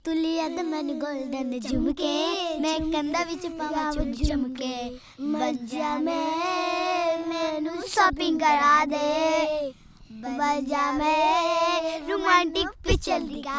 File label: Sung by Three Children Jodhpur, Folk Songs; Chitiya Kalaiya; Samuel Corwin; Selected Field Recordings from India and Nepal Volume I Folk Songs